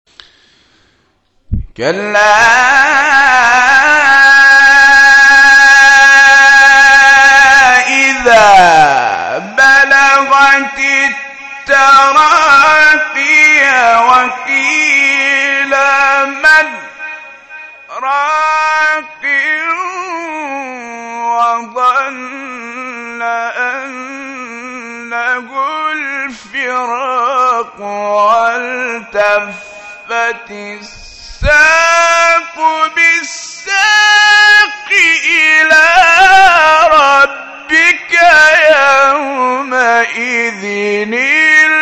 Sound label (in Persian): تلاوت روحبخش آیه سوره; 26 30; قیامت